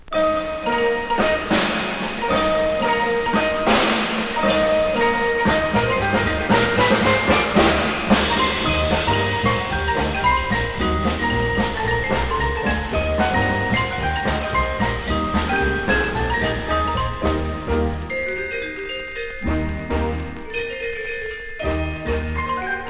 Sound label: PERCUSSION ENSEMBLE